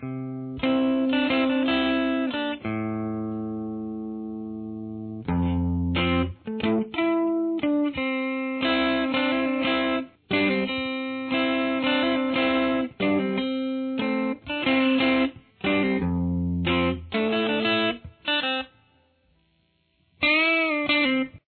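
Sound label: chorus lead distortion, guitar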